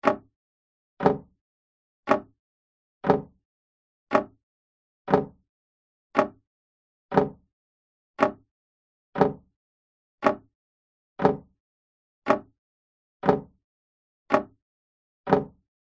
household clock grandfather old tick tock designed; zapsplat